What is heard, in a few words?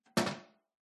household bathroom toilet lavatory lid plastic lower